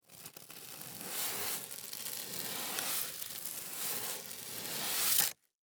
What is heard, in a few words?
Household Broom Scraping On Wood Close